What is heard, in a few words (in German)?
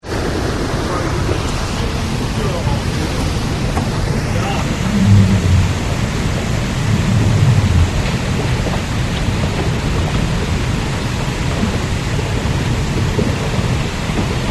schleuse; schweden 2000